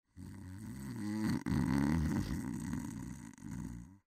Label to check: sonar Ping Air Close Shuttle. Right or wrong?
Wrong